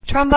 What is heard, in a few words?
voc trombone